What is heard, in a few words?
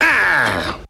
"ah"